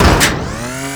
Injection Arm Mover Start; Ambience; Warframe; Grineer Ocean; Ocean Sabotage Injection

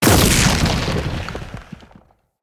Random Lightning Discharge; Characters; Enemy; Abilities; Nimrod

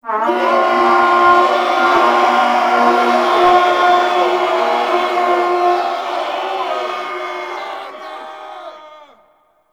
Fall Back Stinger; Makvoss Cannon

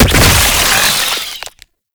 Brood Spider Explosion; Warframe; Oraxia

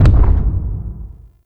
Arachnoid Camper Distant Step, Enemies; Warframe; Corpus

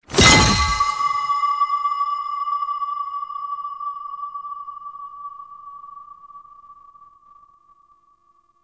Crystal Cluster Spawn, UI; Citrines Last Wish

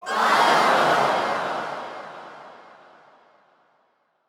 Pain Empathy Crowd; Lunaro

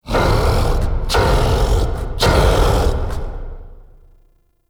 Start Wave; Warframe; Entrati; Mirror Defense

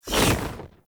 Blade And Whip Pull Back; Warframe; Melee; Dagath Blade And Whip

